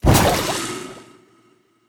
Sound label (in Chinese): 转化声; 尸壳